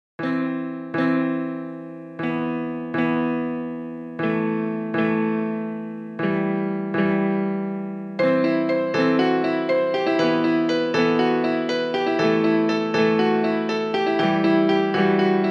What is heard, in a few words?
rusty piano